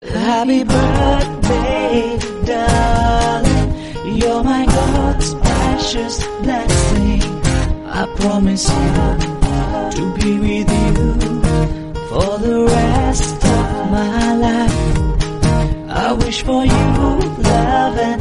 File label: happy birthday darling, happy birthday my love song english